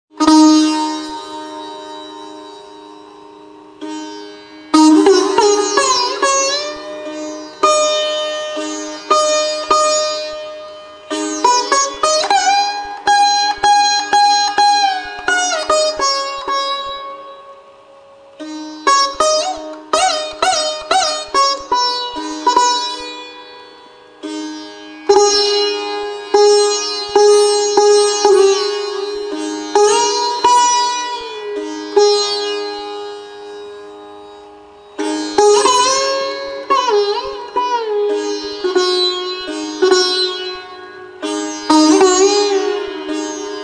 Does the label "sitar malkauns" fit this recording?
Yes